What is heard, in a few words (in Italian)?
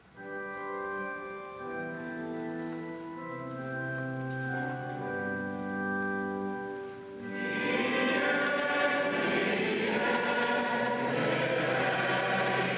Kyrie Eleison, Coro